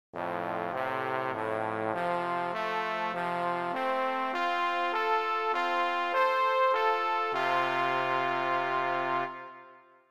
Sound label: trombone